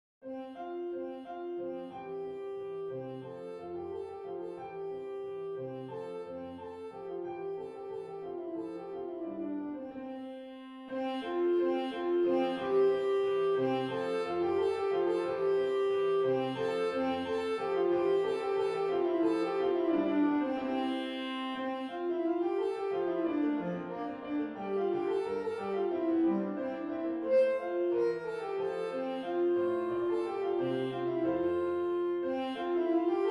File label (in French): gavotte majeur; corelli arcangelo